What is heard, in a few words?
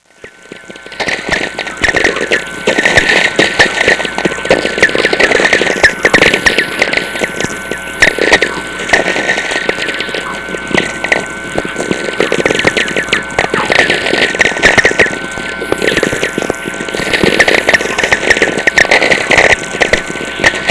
chorus; Natural VLF Radio Emissions